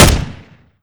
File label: ar2 single